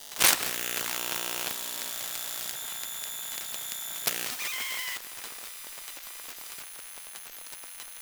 Electromagnetic Smartphone On & Off Mono; Essentials Series NOX SOUND

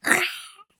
monster; CC0 creature sfx 2

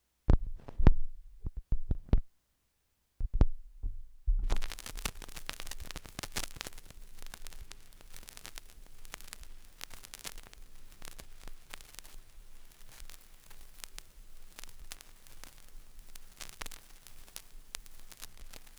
Needle Drop, record fuzzies; Micro Pack

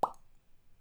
pop; abstract; Free Sound Assets

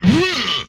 Attack, Vocal; retro arcade; MMRetroArcadeSoundsPack

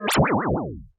weird, sfx

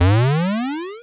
square jump big long; Square Waveform sfx

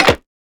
Door Lock; FREE SFX PACK VOL 3

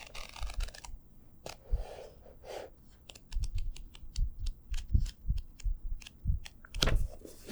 Riffle Pages, books, paper; Books, Paper, Writing

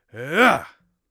magic attack, Mage Voice Pack

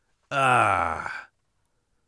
satisfied, warrior voice; Warrior Voice Pack

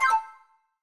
confirm, tones; inteface sfx pack 1

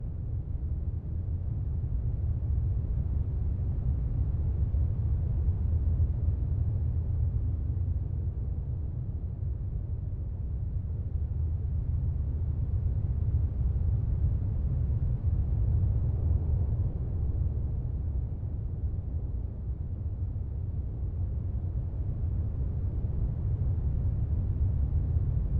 Ambiance Cave Deep Loop Stereo; Nature; Essentials Series NOX SOUND

